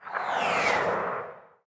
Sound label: combat atmosphere; World of Tanks